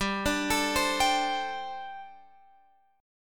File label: G Suspended 2nd Suspended 4th, Guitar Open F; Gsus2sus4; arpeggiated; x,14,14,16,15,14